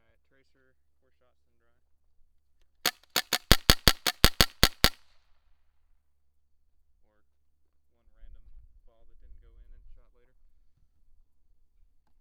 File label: trracer raw autotrigger, guns; trracer raw autotrigger no clip